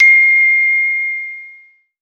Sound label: tinkler, synths; Notes